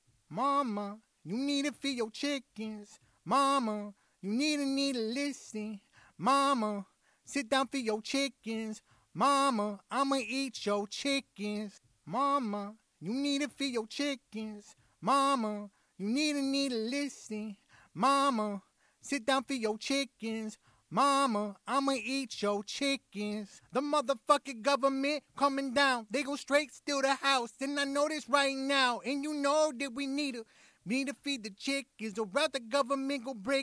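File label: hip hop 82 Cm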